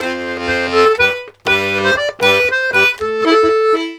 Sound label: Accordions, 120 laride; USB Soundscan vol.40; AKAI